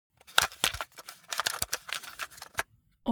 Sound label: object media cassette rattle long